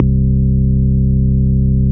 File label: Basses C; Vengeance Samples